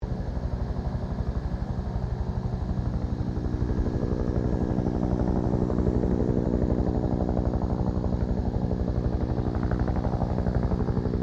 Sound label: HELICOPTER 1967 BELL UH1 IROQUIOS PASS